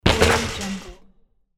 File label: basketball missed shot